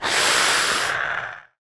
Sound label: Lizard peace war idle